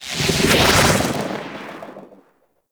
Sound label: Transference Operator To Frame Cast; Warframe; Focus